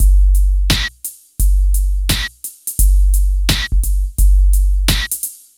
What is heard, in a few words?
DRM, Hip Hop and R&B Drumloops